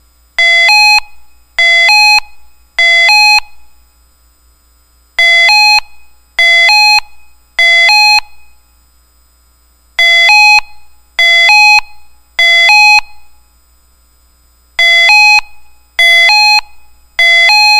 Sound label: special warning